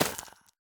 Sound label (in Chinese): 根 破坏声